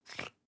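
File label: toothpaste open twist hard, Bathroom; haus-garten; badezimmer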